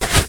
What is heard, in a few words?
sand place; everness